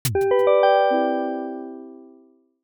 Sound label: logo clear signature